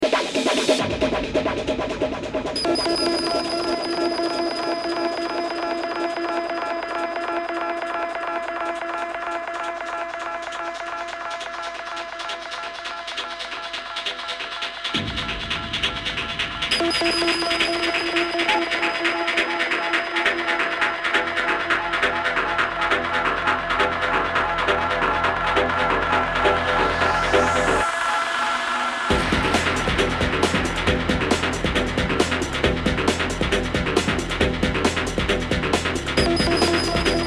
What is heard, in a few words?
prophet dub